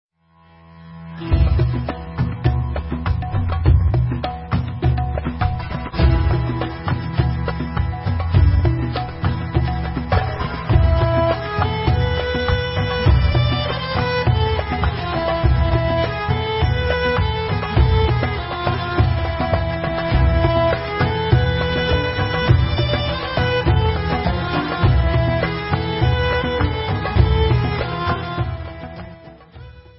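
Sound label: Instrumental, Israeli Dances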